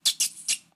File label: Rats; Rabbids Multiverse